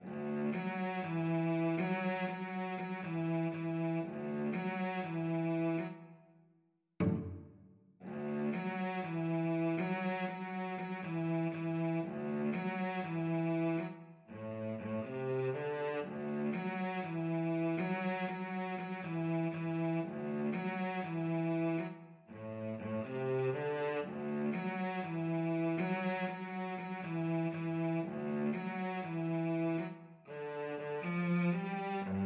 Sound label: samba, cello